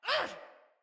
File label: Attack Female; Battle; Envoy